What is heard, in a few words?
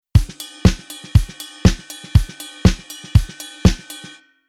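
Rhythm Disco pattern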